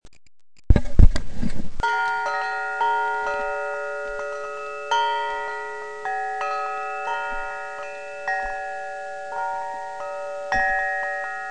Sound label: bell; pocono mountain